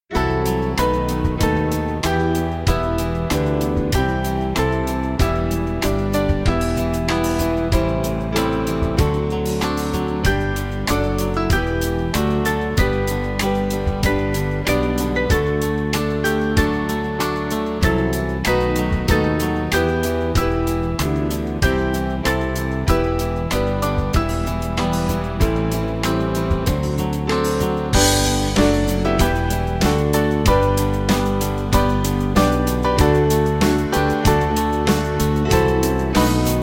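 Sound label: Music Backing Files for Churches; Jesus Full Of Grace; Hubbard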